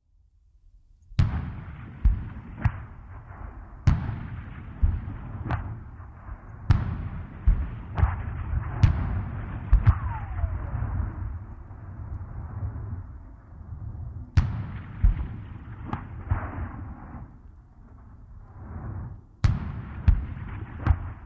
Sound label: War, shooting from large caliber weapons